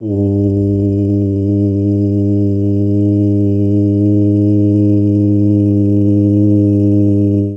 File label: vocal o; digital signal processing lecture